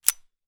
gun 1911; weapon; still north media

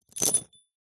industrial bolts metal few small set down carpet

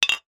vodka bottle hit against glass; food drink

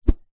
warfare weapon knife swing swipe air whoosh

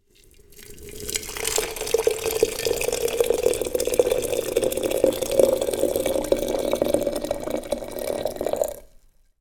hot water pour into teapot from kettle

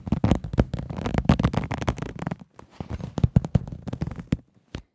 Creaks n Squeaks Leather Creak; soundbits

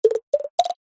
cartoon drips stereo ascend x3

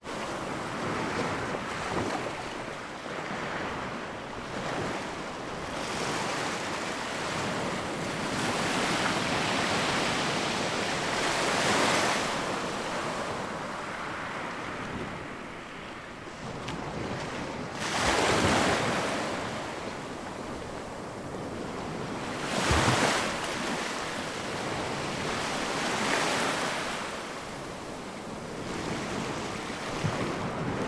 nature ocean waves recorded on jetty

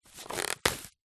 foley plastic bag very thin rip split; zapsplat

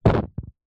match flame blow out; household; zapsplat

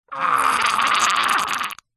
human male strangle vocal; zapsplat

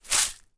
seasoning large pot tip

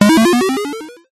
multimedia game sound classic 1 up

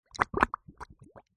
cartoon slime goo bubbles swamp single; zapsplat

